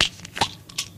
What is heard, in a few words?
pills; Barotrauma; Neurotrauma